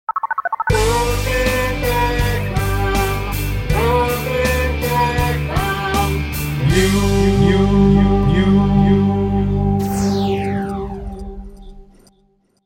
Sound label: jingle; Brooklyn Beckham News